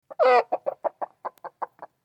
chicken noise

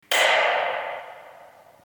loud lightswitch

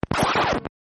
disc scratch frog sound

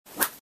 whoosh pointer

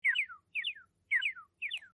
bashfulness; animal crossing

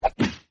karate chop; sandy

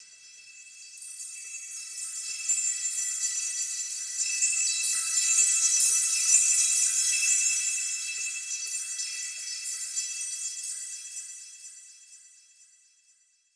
twilight jungle

